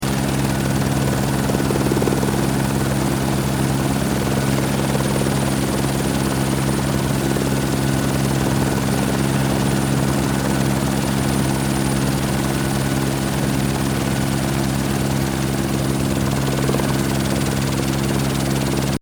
Helicopter Hover Loop, Helicopter hovering or flying; Seamlessly looping sound; Aircraft; Helicopter Sound Effects